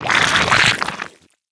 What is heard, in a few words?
Slime Attack